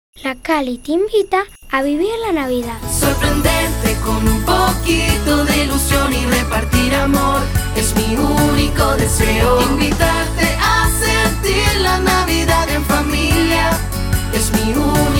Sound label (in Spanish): Jingle Navidad Con Voz Infantil; La Cali